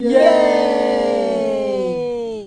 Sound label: yay; botrace